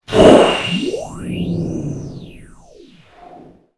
explosion

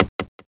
Knocking

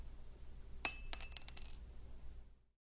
pin drop

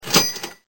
cash reg

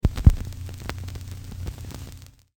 needle drop noise

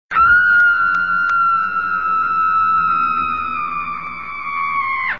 Woman Screaming

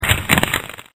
paper crumble

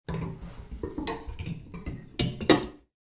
metallic clonks

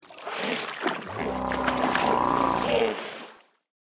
water

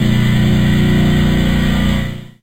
mech

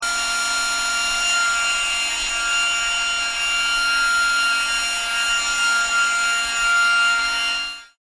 Air Pump

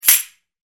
Carnval Shkr Frnt

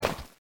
fall

